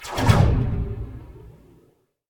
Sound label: Twisting Blow Whoosh